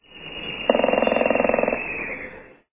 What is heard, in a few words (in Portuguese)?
pica-pau-chocolate